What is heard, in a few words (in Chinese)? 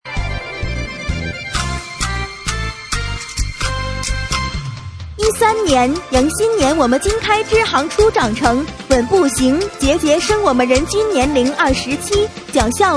快板